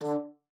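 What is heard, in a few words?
D3, bass flute; Xsample VOL-2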